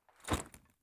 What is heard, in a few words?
Hung A Backpack